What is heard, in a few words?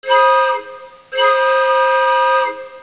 train